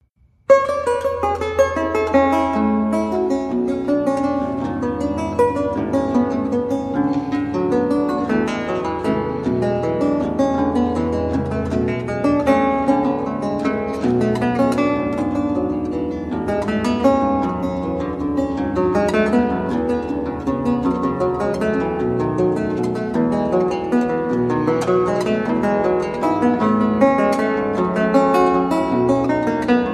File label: Courante, baroque lute; Weiss